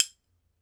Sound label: B.BONES, Ireland; E-MU Producer Series Vol. 7 – Old World Instruments